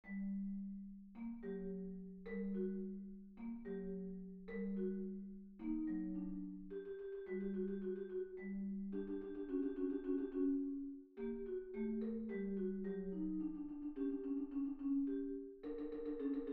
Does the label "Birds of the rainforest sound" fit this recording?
No